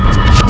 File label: door